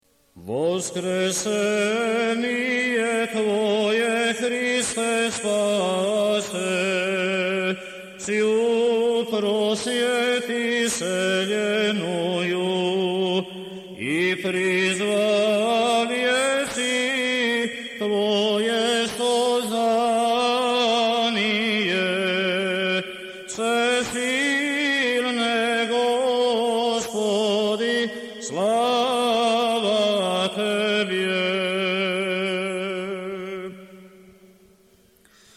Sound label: Osmoglasnik II glas